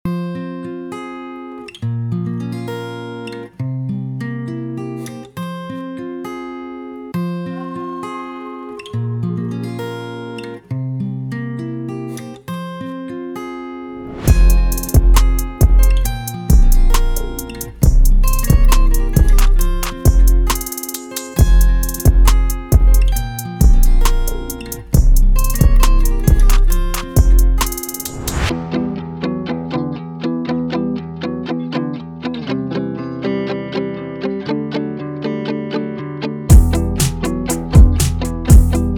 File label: beats; traktrain; enigma